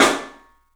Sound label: PIC SNR L, PICOLO ROOM, 3D Drums; 3D Sound